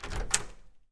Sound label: door open; Golden Crayon Sounds